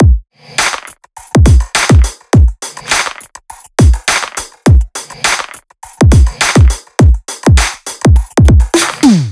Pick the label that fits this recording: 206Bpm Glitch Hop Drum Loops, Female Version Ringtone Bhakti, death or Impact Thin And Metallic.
206Bpm Glitch Hop Drum Loops